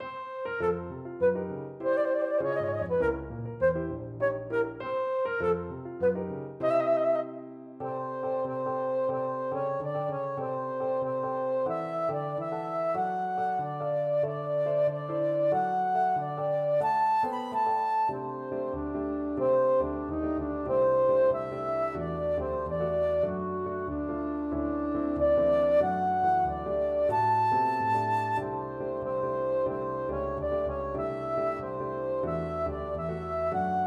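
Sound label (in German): Klavier und Flöte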